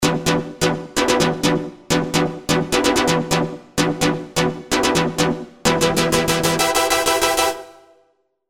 Stab, SERUM; Doorn

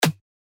Percussions; TECH HOUSE

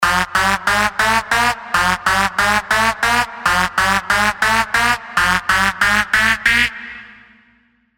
Tonal Risers (140 BPM) 4bar F

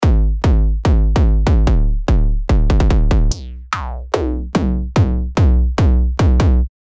Gabber; Spire

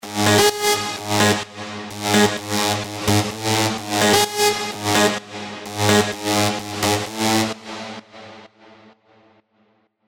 Big Room Leads G# WET (128 BPM)

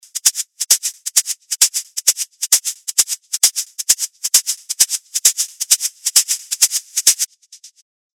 Shakers, SERUM

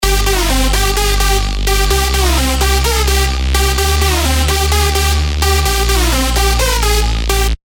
Big Room Leads G DRY (128 BPM)

